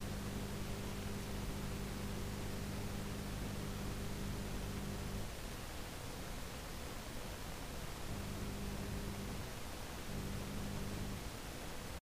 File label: 50hz hum remove